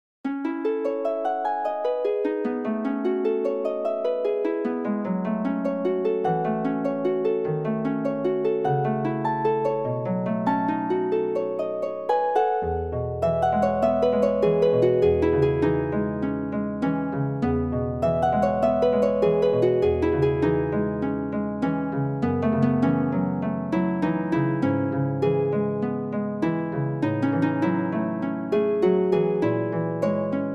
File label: arabesque harp